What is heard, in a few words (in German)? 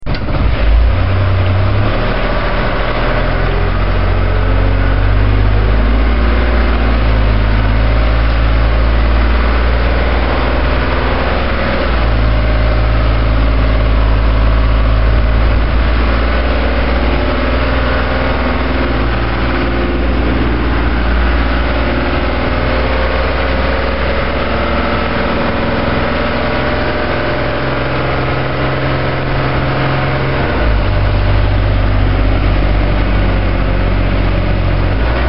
Motorsound, Feuerwehr